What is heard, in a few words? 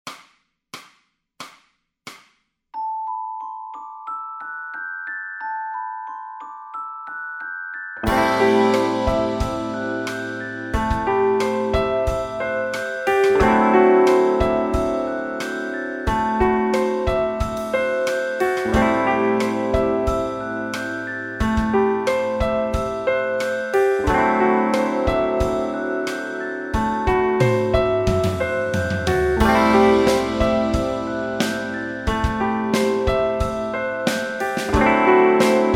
bass modes, backing tracks